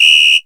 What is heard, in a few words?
whistle, Yamaha RX-5